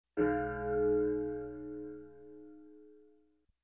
Mellow Metallic Bell

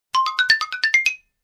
Xylophone cartoon ascending melody

